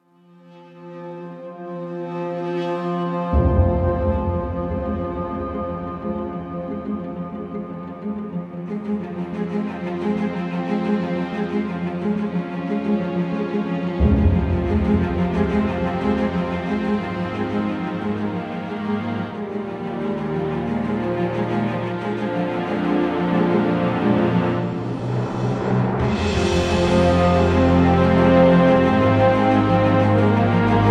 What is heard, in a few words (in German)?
Opener Cut Trommelbreak